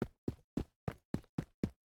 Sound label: run Dirt; butterflysoup2